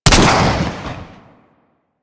Swarm Missile Launcher Fire Shot; Warframe

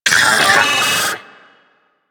Arachnoid Vocal Leap; Enemies; Vocals; Warframe; Corpus; Arachnoid Hunger

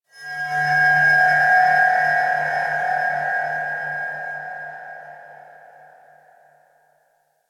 High Shimmer; Warframe; Ambience; PVP; CTF Orokin

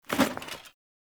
Foley Action Armour Melee Heavy Swipe; Characters; Player; Deora Armour

